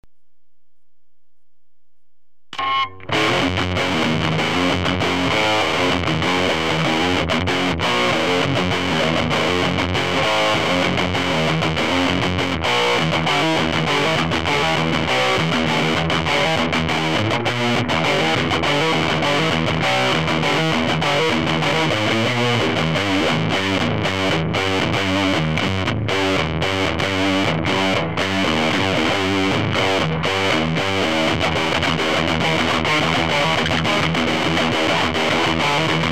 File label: Explorer; standard pitch